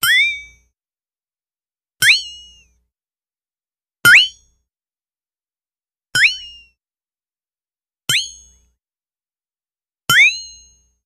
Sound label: Metallic pwink, high pitch; chimes & bells